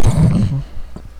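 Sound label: pickup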